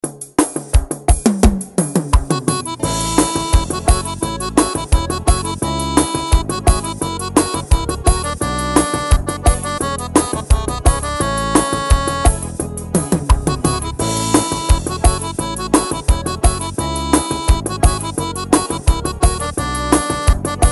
ritmos yamaha forro xote zabumba

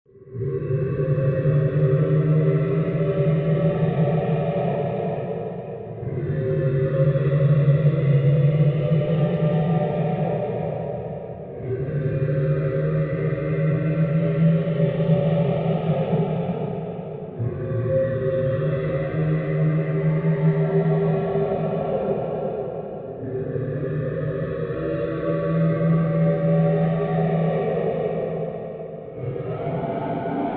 ghosts screaming terrifying horror sound

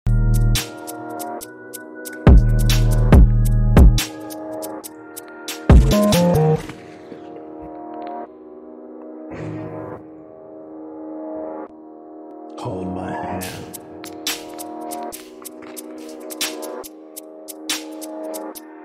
breathing

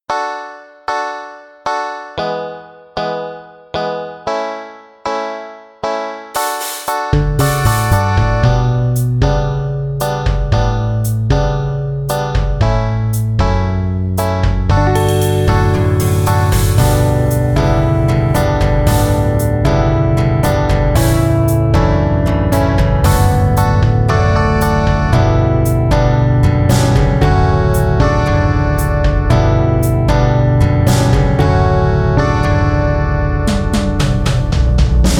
rain under the sunlight instrumental

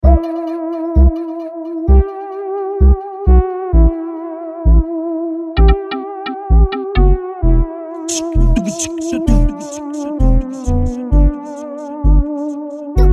instrumental

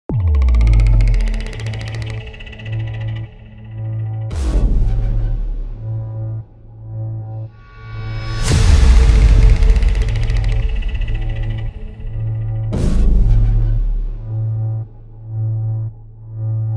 creature epic creepy hybrid horror sound design; jackdaw factory

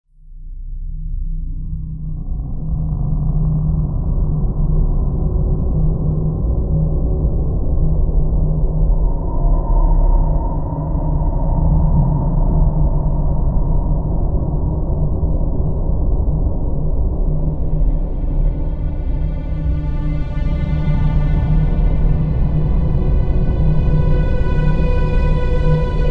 dark horror music; for videogames films

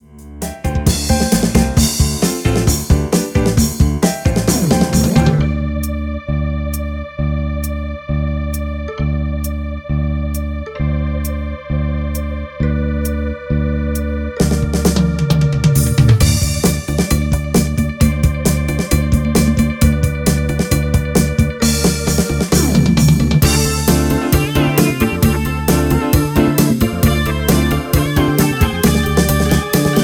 rock solo; Rock demo solo #2; nelsonMidis

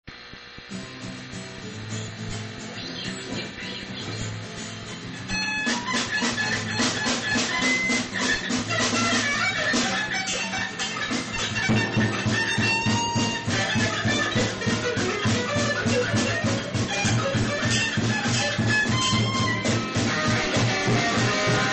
rock scream

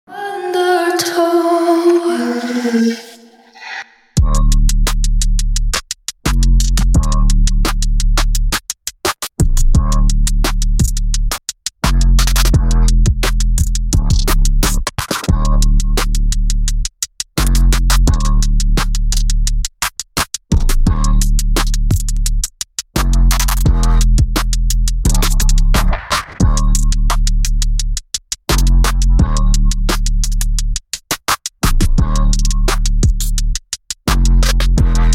trap instrumental

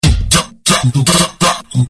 beat; base de funk

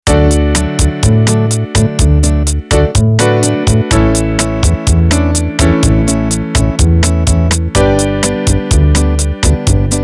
instrumental

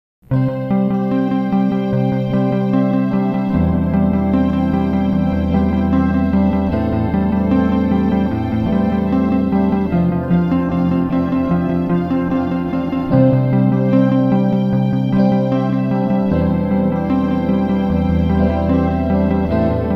backsound end background

